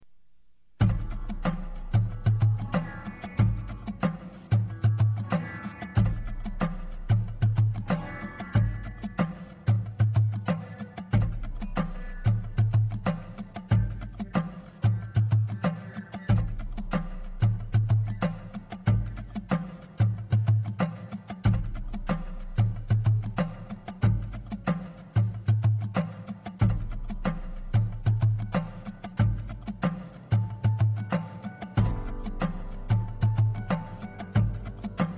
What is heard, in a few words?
piano pass